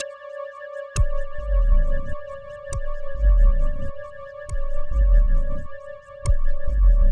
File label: Synth Bass; Bass X